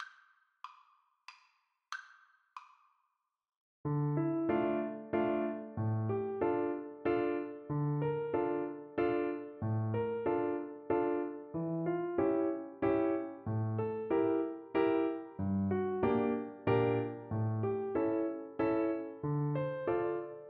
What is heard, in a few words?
swallows waltz, Flute